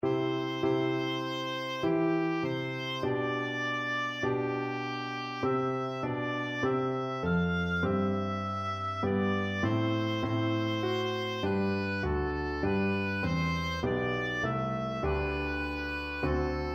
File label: o come, oboe